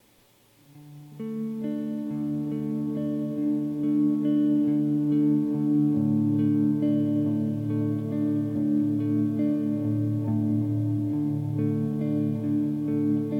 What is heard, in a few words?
GUITAR D MAJOR LOOP